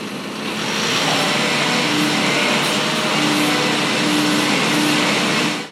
kouji

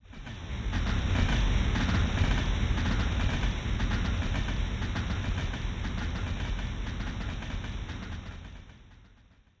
train

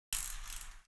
click

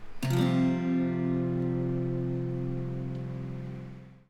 Csus4, guitar

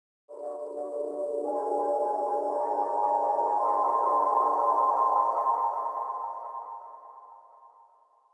hollar

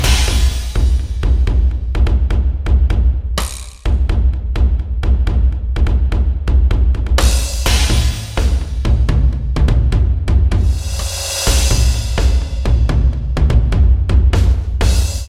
taiko

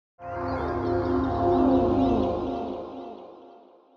hollar

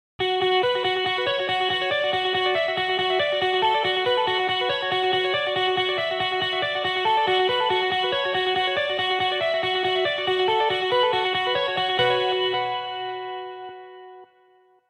delay

